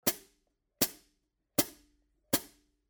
new beat hh foot chicks

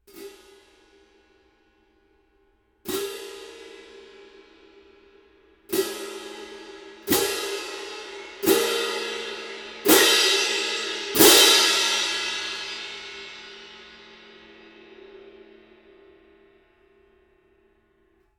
19in zildjian stadium medium heavy

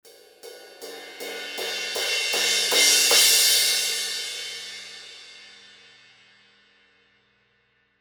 19 zildjian thin crash crescendo hits

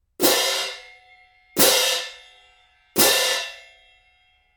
18in a zildjian viennese symphonic

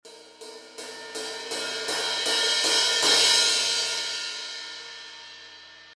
18 zildjian heavy crash crescendo hits

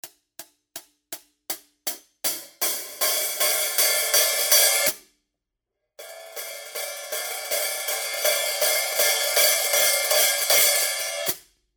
hits closed to open, hh audio

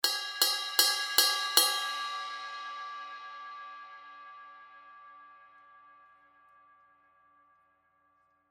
zildjian heavy crash bell hits

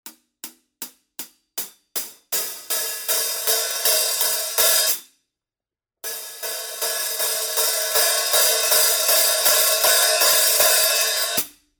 quick beat hh hits closed to open

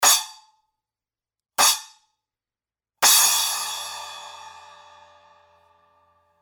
10 zildjian flash splash crash choke

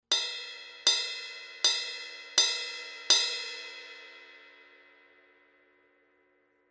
18 a zildjian uptown ride, bell hits

